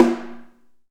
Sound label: HAIRCUTS, KENYA DRM, Heart of Africa